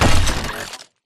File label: footstep shuffle; Titanfall 2